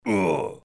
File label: menu; beef misc